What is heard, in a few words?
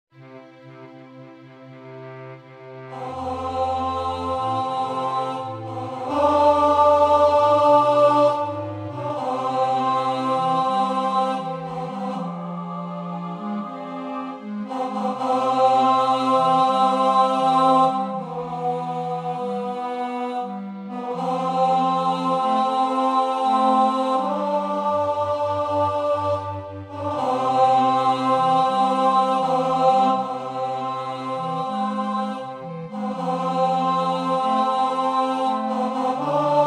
Tenor